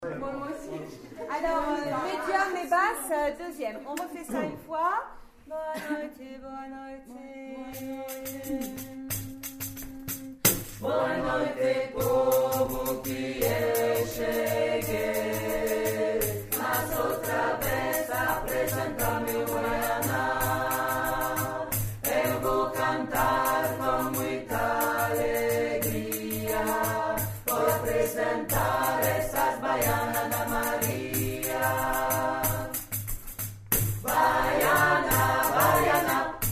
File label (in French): Baiana medium et basse